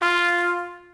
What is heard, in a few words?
Trumpet tone; game rip; Lego My Style Preschool